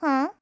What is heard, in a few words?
Caring Huh; Peatrice (caring): "Huh?"; Skyward Sword; zelda